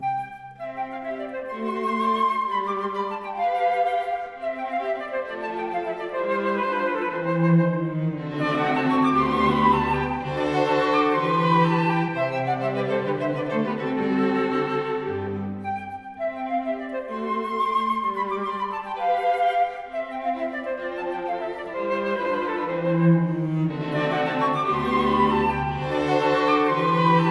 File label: flute quartet in C; Variation I; Mozart; K.285b